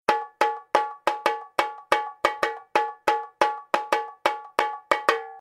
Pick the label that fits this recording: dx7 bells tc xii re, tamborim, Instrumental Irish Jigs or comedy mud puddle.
tamborim